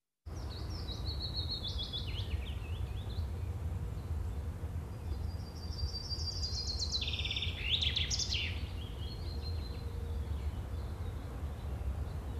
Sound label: Nature ambience on field with airplane sound